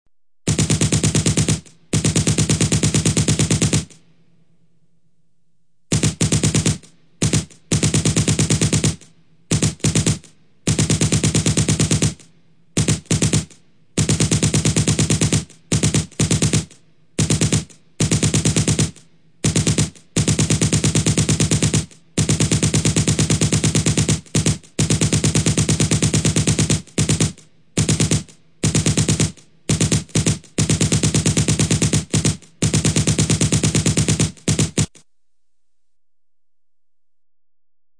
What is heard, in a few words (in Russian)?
Avtomatnye ocheredi